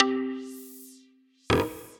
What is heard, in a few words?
Dense Pluck, Guitar & Plucked; Drive and Glow; Ableton; Factory Packs